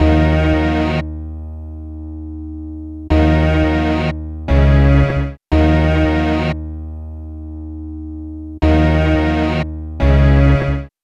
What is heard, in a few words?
South Hip Hop String Hits Ebm; Digidesign; Pro Tools; Pro Tools Essential Demos; Essential Loop Demos; Demo Session - Hip Hop